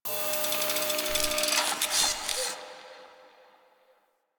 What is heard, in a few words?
Mods Station Anim Off To On; Interior; Ambience; Warframe; Nineteen Ninety Nine; Tailor Shop